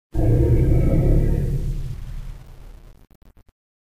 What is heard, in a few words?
stone move